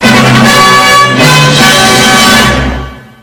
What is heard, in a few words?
Double Pitched EARRAPE; Warner Bros. Television Double Toned